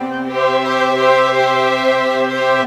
Strings; Sonic Foundry (Sony Creative Software); Orchestral Series VOL-4; Rock and Pop; Rock-Pop 12

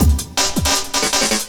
LOOP, 160 BPM, Drum & Bass

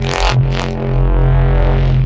Reese Bass F; Antidote Advent

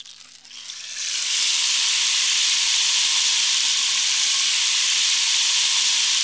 rainmaker; Zero-G Ambient 1; RAINMAKERS

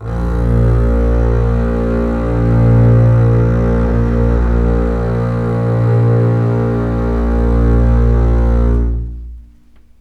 A#1 LEG F, String Collection; East West; The Ultimate String Collection

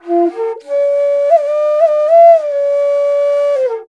INDIA BASS, IND BASS; Spectrasonics; Heart of Asia; IND BASS PH

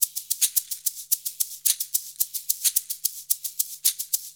110 MIX, Percussion Loops

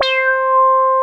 JUNO FUNK C6; Drums In Your FACE!

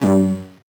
VocoBit Noise, 8-bit; musicradar; 8-bit bonanza

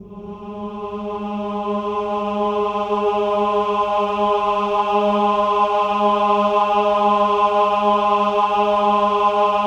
OH-AH G#3, choir; Spectrasonics; Symphony of Voices

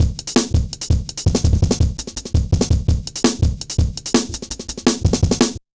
Jazz Shuffle, Drum Loops; Zero-G Jungle Warfare 1, 2 & 3; 90 s Sample CDs

